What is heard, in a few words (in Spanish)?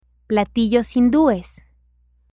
Platillos hindues